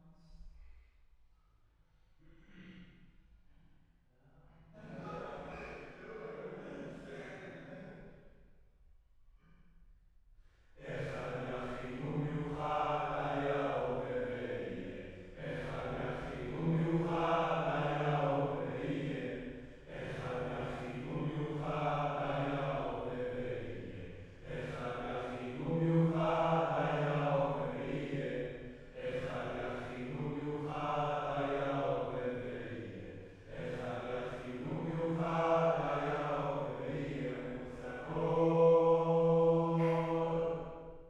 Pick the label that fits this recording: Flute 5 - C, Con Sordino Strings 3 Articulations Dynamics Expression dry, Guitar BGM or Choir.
Choir